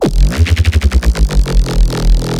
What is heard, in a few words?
Nice Reese Bass